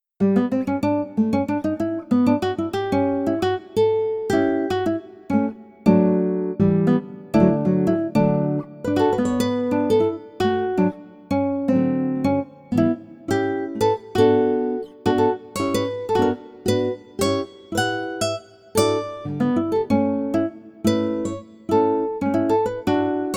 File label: Guitar